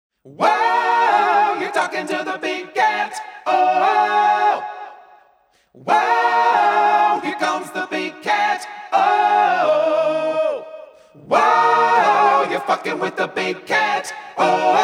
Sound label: Big Cat Walk on theme Standard